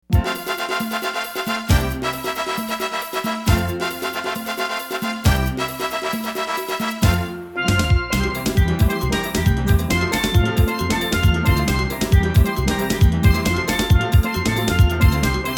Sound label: Steel Drums